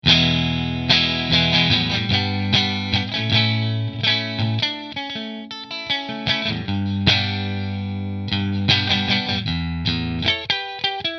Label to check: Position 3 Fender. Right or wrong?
Right